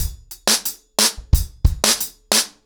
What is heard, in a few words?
ACOUSTIC DRUMS, 90 BPM